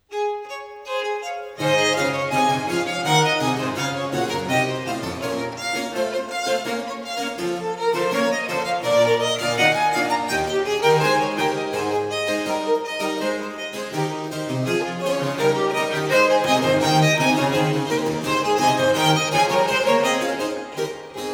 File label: Allegro; Trio Sonata in D Major; TWV 42 D13